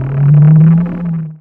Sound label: SHORT, SHORTNOIS